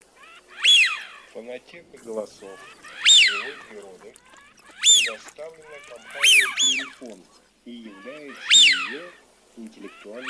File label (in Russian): Свиязь Fischione; plurifon